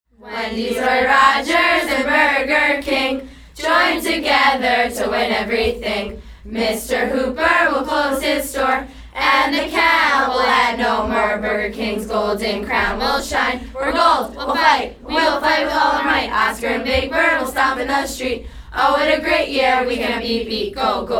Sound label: cheers